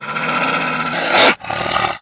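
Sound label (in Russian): злобный рык старого больного хищника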